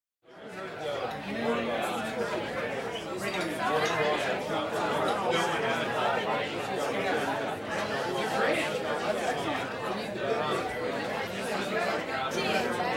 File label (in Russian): mnogo ljudej golosa navesele